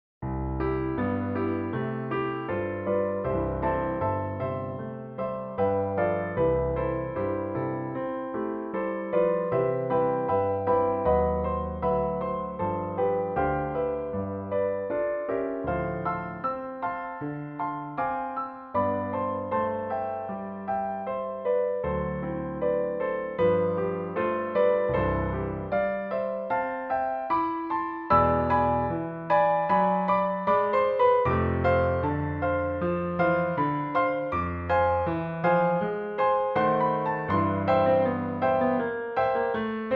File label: accompaniment